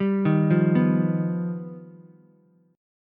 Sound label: G Augmented 7th, Ukulele; G+7 arpeggiated; JGuitar